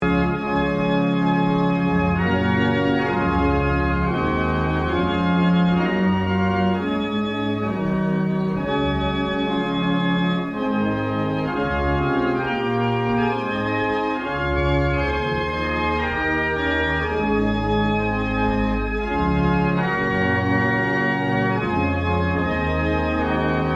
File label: Bristol, barrel organs; Brightling